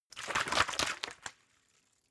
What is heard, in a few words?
bottle water shake short; berufe